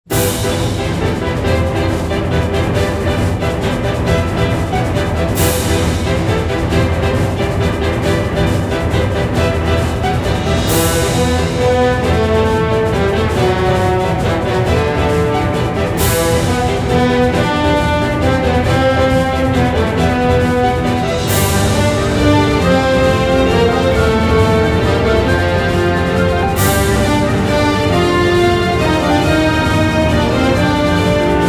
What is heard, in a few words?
P V P Battle Intro